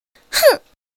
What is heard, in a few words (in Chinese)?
哼 女生; 抖音常用音效